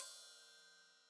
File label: Hat Open, Hi-Hat; Deeply Sampled Drums